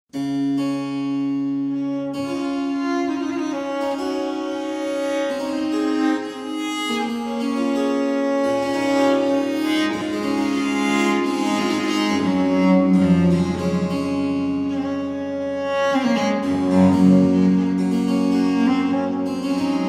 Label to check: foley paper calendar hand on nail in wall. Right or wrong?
Wrong